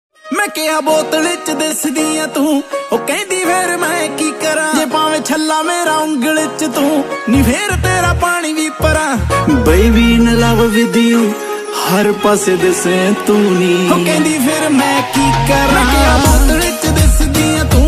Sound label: Trending Punjabi Ringtone